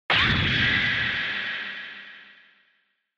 Retro Anime Robot High Long

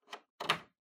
TV Hardware Earphones Plug In

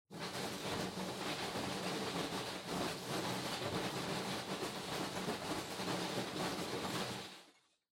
Loose Fill Packaging Far Rustle; 梱包材

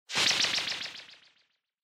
Motion Agility Delay

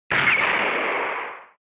Retro Anime Gun Low

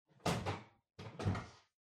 Washer Front Load Far Door Open Strong

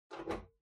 Pendulum Clock Hardware Far Key Insert

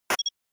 SNES RPG Defend

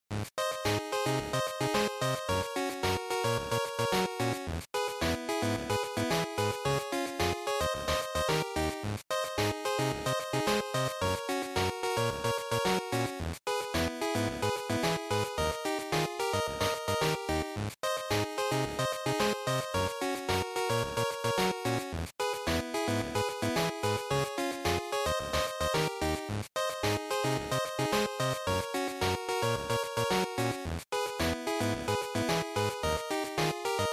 Racing Result, bgm

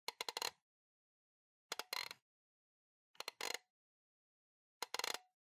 Clock Alarm (Dial)

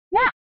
Fighting Shout; SNES

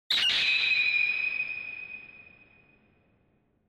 Flash Low Long; オノマトペ 光る; 低 長

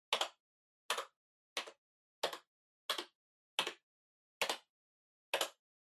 PC Keyboard Far Single Mid

